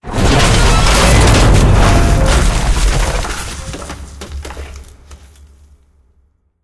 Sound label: train car fall; video games; source engine; portal2